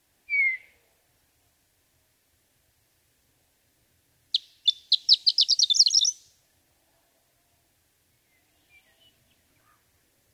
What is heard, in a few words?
Horned Lark